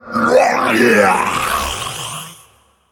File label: Male Faceless Death